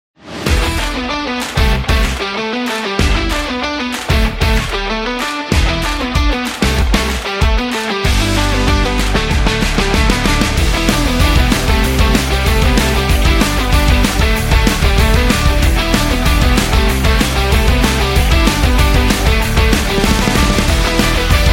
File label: Uplifting Rock